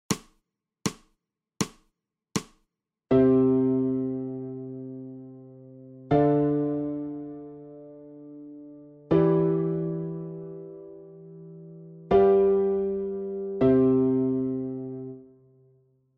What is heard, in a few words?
ALTO